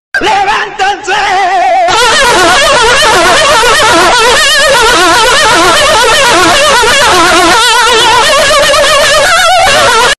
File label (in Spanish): alarma peruana